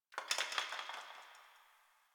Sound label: Brick Crumble; Ambience